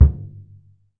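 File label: HAND MALLET, Surdo; E-MU Producer Series Vol. 2 – More Studio Essentials; Composer; Surdo Pallet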